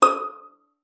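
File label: violin pizzicato D#6; Xsample VOL-5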